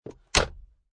Transportation Automobiles, Car Interior, 1996 Acura Integra, Glove Box Close, No Engine